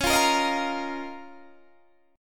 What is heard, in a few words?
Db 6th Add 9th Db down Guitar Standard; Db6add9 strummed; JGuitar